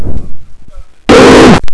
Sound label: Grrr